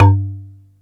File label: marimba G1; East West; Global Sounds